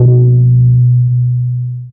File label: Long Synth Bass short